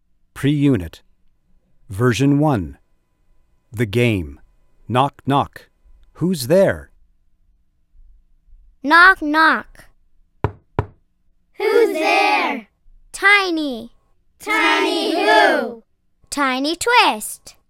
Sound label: knock knock